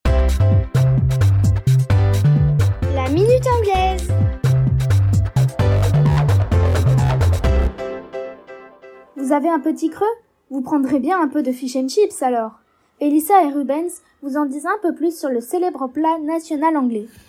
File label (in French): Minute anglaise